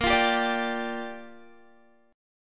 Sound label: Bb 6th Bb, Guitar; Bb6 strummed; 18,20,17,19,19,x